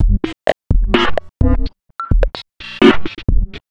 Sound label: placeholder loop 128BPM; drums; percs, foley; base pack